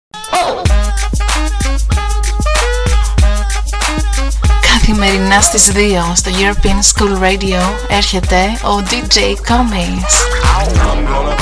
HOT MUSIC SPOT ADVERTISE

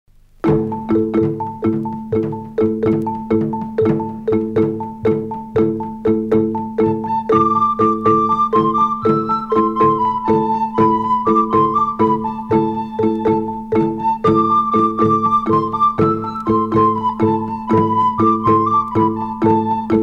instrumental 7 8

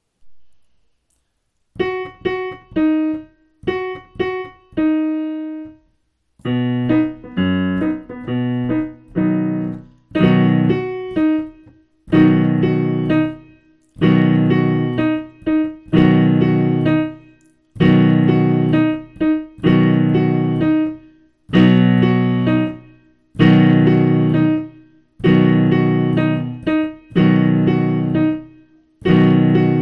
Alali piano

